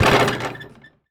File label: Street Ball Glass Rim; SFX Kit; Street Basketball